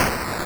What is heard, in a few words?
fail; breakout